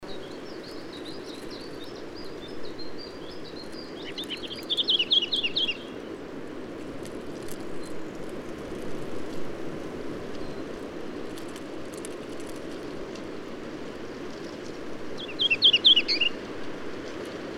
Humes Whitethroat Sylvia althaea althaea, song, Ulytau, Kazakhstan